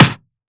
snare drum sound c key

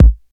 original kick sound a key; kick drum samples

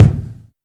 low tone kick sample G sharp key; kick drum samples; one shots

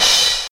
cymbal sound b key, Loudest frequency: 4450Hz

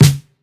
boomy snare drum sound d key; snare drum samples

